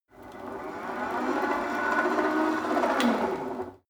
rope trainer pull sequence medium short, fitnessstudio; sport freizeit